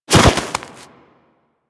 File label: Bird Of Prey Bodyfall Snow; Warframe; Hunting Critters